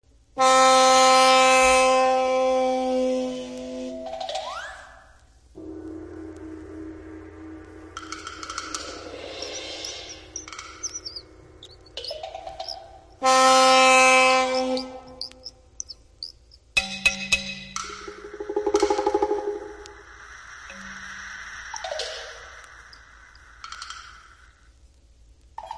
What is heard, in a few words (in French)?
bruitages divers Percussions; Pierre Gabaye